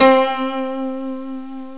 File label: piano guitar